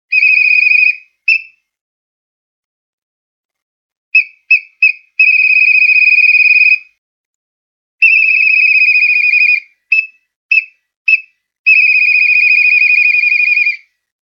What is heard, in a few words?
Whistle